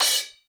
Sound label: crash